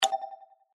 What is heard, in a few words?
Deal button, baccarat; TABLEGAMES